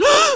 drain; Exile III v1.0

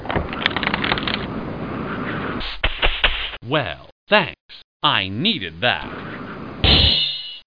wiper; T.J. Finds a Friend

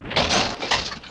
player damage; Peripheral Empires

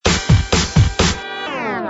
music wrapper in; Jungle Reporter

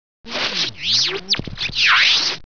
space blips space blips; DR BRAIN; BUGS

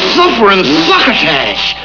Suffer; MediaCollection; Journals

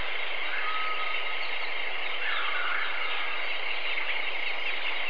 jungle

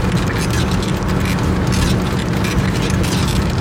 Wheel Asphalt; crc2005

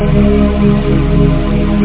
string

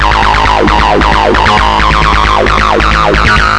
Elevating Loop; Guitar&Electro

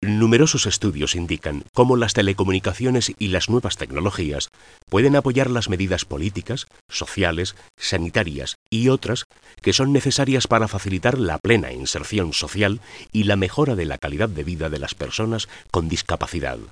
pad; Super League

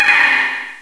Score, Pinball Demo Music; 3D Ultra Pinball Demo

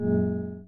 Window All Desktops; kubuntu-8.10

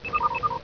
DRONE; Marathon revisited

